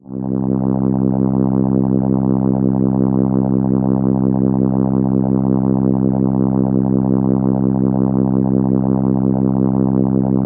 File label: Contra C4, ARP Solina